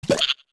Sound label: Hop; Gekko hopping (4 pitches)